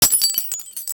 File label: break glass; broken